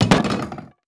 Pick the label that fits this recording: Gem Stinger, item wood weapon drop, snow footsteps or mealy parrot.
item wood weapon drop